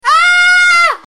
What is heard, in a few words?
scream in fear